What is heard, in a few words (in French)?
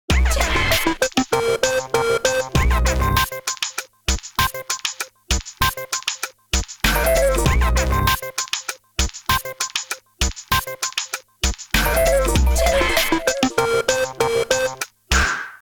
Comedie jingle; Jingles medley